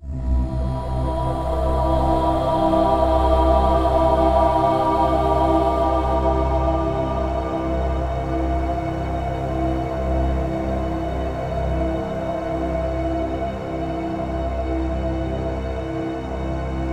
Mystic Choir Sweep; Trials of the Blood Dragon

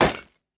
rotten food drop; Devour 2021 gamerip pc macos

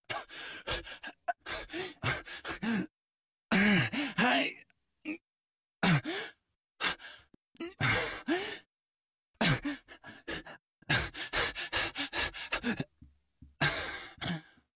Minigame Attack

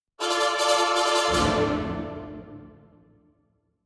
Combat Out; The Witcher 3 Wild Hunt; Novigrad

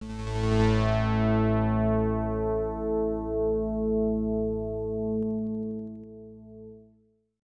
Menu Jingle; Neural Gear; X68000

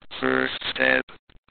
first step; edge extended; android gamerip 2012

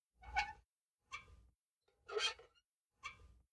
Lantern Swing Burns; The Town

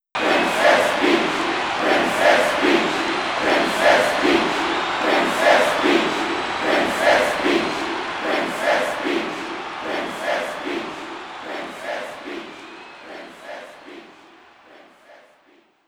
Crowd Chant; mario strikers charged football wii gamerip 2007; Peach

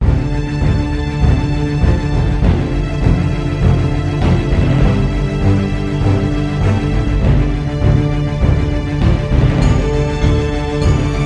Main Theme String Melody; magic mayhem the art of magic

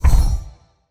Shield Block; Shield Minion

